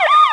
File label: SIRENA; vehicles